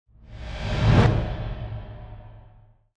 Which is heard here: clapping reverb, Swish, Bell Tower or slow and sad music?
Swish